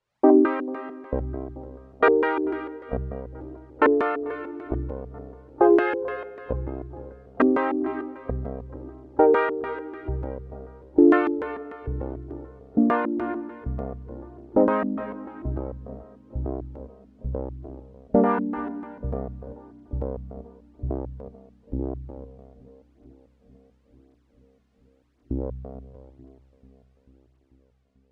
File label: Lounge Pulse Chord